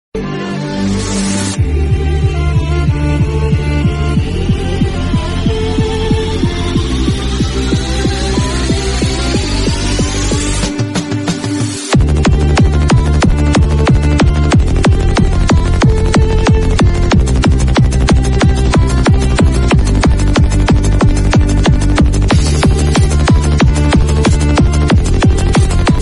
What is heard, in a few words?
mood off ringtones background music broken ringtone